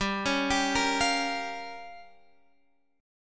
G Minor 7th Flat 5th, Guitar, Drop D; chordshape; down

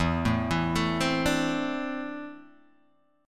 E 7th Suspended 4th Sharp 5th Guitar Open D

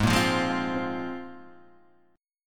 A sharp 7th Suspended 2nd G sharp, guitar

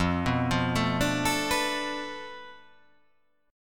F 6th Flat 5th F down, chord

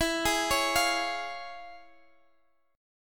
Db Major E; arpeggiated; JGuitar

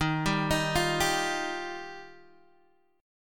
Eb Major Add 9th, Guitar DADGAD

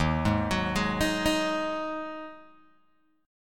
Eb Minor Double Flat 5th Eb Guitar Open G

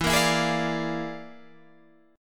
E 7th Flat 5th Flat 9th; JGuitar

